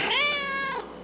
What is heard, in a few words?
cat shorty